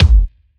small reverb kick g key

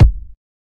fluffy kick sound g key

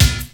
dry acoustic snare sample d key; 80s; Duration 0:01; snare drum samples

